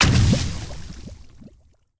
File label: Bubble Splash; Game rip; MiSide